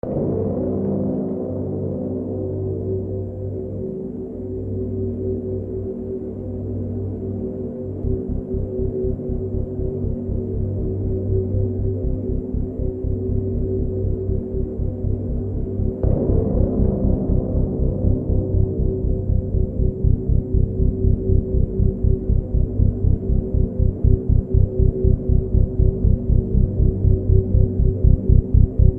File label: Tense; Serious Sam